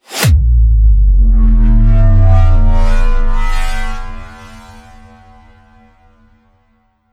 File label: Bass